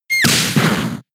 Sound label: Knock; pokemon sfx gen 6 attack moves xy oras